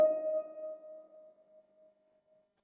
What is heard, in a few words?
swap